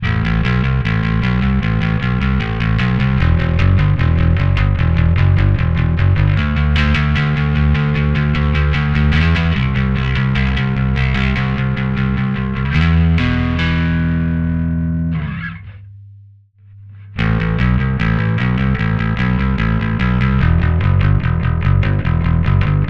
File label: Midswitch dry